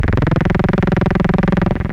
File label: monodelay Dm 135, Loudest frequency 442 Hz Recorded with monotron delay and monotron - analogue ribbo; synth loops